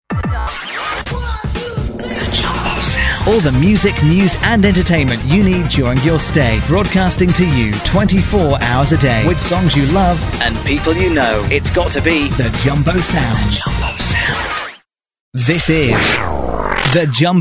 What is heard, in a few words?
JUMBO JINGLES; BEDROCK